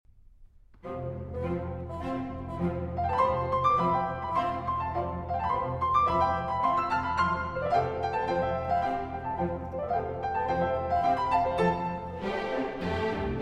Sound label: Piano Concerto in F Major, Giocoso